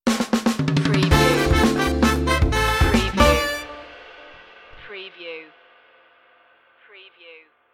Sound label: high funk stinger